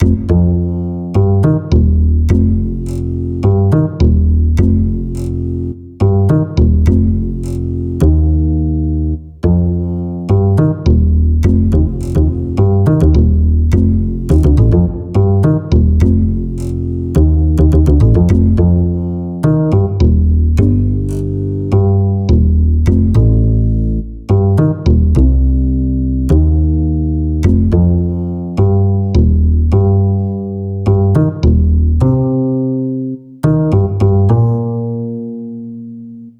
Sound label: Bass